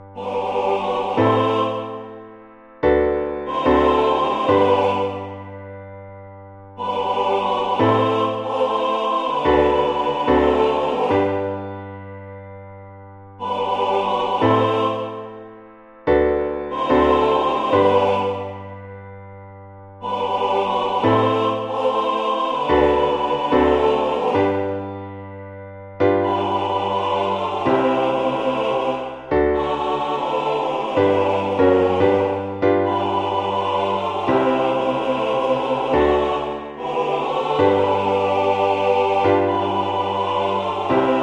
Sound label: MIDI, SATB